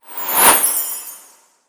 Progress Bar Going Up; Clawee

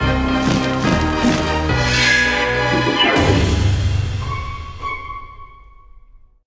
Bat

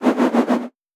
Fire Elem Inferno

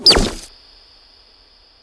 Jump up Snd Jump up; Go, Kizi, Go!

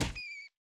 Cute Animal Dead; unsighted

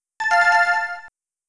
Correct; Hey You, Pikachu!; N64

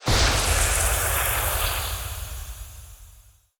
Burst White Stuff Move Up

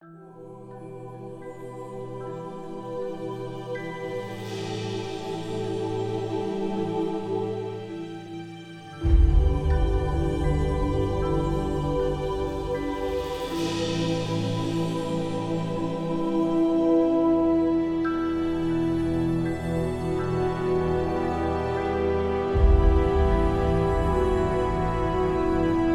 forest music

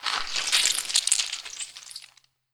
Skel Dog Bite Gore

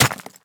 Stone Arrow Hit

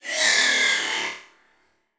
Spider; Legend of Keepers

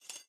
Maze Retro Game Chain Pull

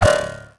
eating; MiniGames; light yellow

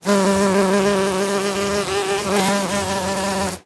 Insect, Buzz; Frogsong

